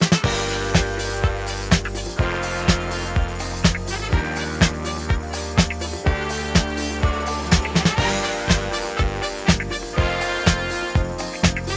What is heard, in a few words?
Music Free Spins Loop